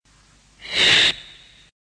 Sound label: Giant Mantis Rawr